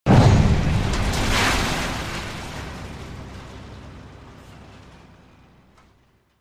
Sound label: Debris